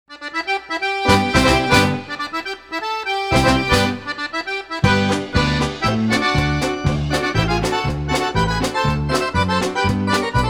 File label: Polka Medley